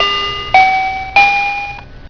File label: DIGITAL FLOOR; TigerMountain WK2.1